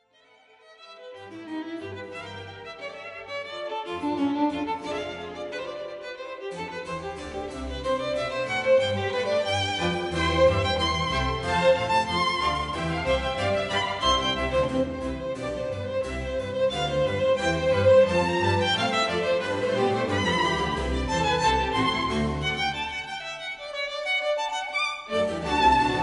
Violin Concerto No. 1 in A minor, I. Allegro moderato; BWV 1041